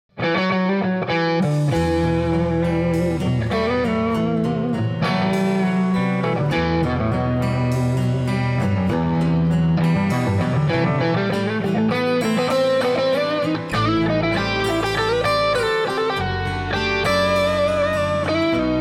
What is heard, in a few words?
Heritage H150 Sanford 1812